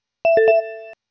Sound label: ring; Gtk2-GoBoard